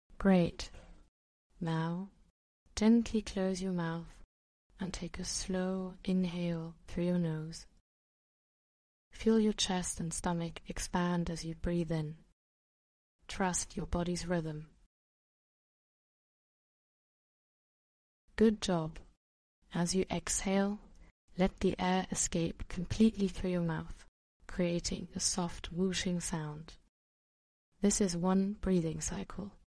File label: inhale and exhale; Panic Breathing